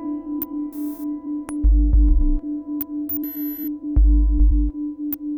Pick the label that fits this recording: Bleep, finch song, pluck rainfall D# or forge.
Bleep